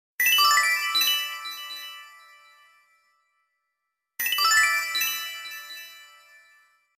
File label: sound of magic